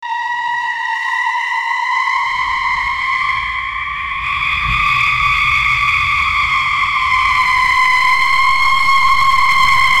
eerie string noise

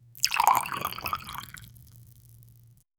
wine is slowly poured int; food and drink

